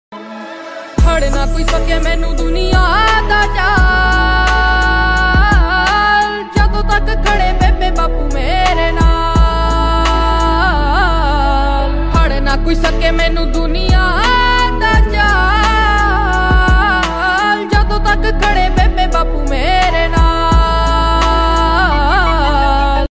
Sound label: ringtone unplugged song